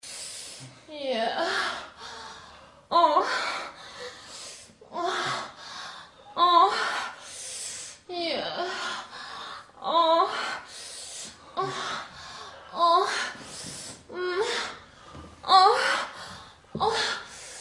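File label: male female sex indoor; Sexy Witch 2 The Bungler and the Witch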